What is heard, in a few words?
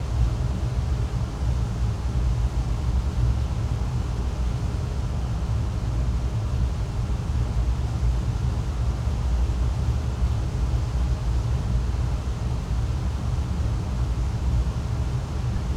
ambience large